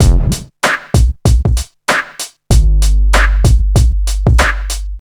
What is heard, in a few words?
96 bpm drum loop f key